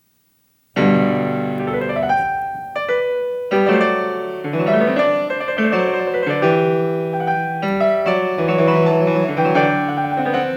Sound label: Variation 16 Ouverture a 1 Clav.; Bach; The Goldberg Variations